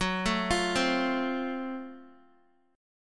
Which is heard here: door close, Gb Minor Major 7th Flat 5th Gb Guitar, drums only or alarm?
Gb Minor Major 7th Flat 5th Gb Guitar